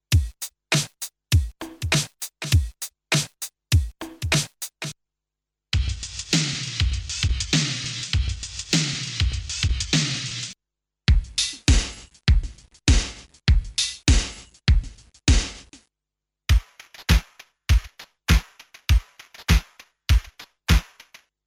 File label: Reason 10 Loop Supply Electronic